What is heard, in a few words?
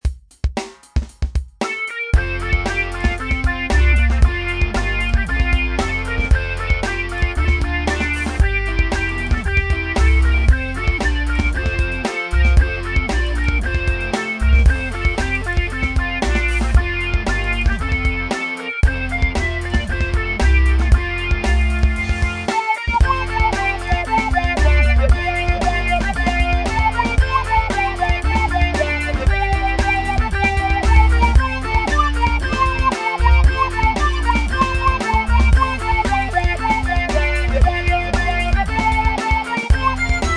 Funk